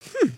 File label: curious, emotes